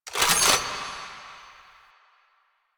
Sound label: Rechamber B; Quincy Assist; Warframe; Nineteen Ninety Nine; Quests